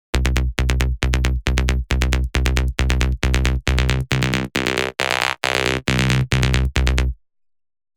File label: Snork, rolling bass; Revealed Serum 2 Rolling Bass Vol. 1